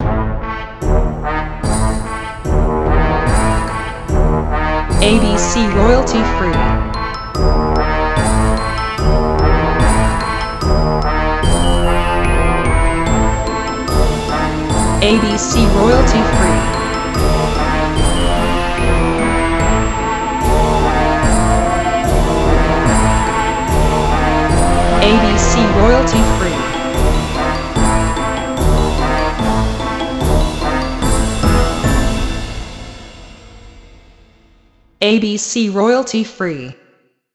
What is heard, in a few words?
Funny Scarry